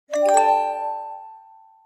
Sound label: Notification